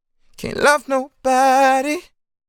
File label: Sung Phrase Dry, 150 BPM G Min; Love Nobody; Cymatics; Euphoria Vocal Sample Pack